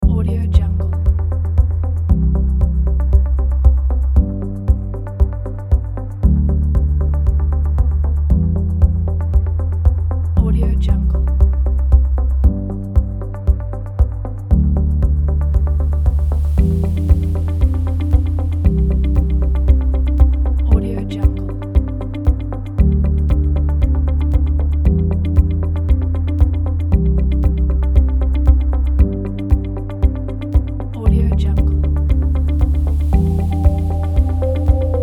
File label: minimalistic ambient